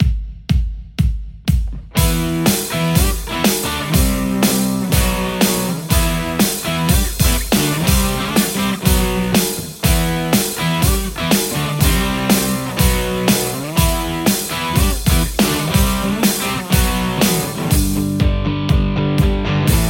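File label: Stratocaster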